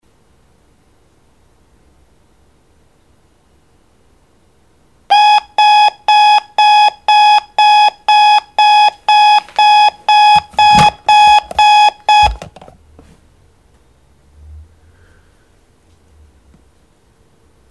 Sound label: hum